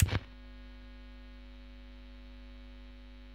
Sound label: AMP HAM; 1000fx